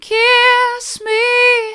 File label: kiss me hard, vocal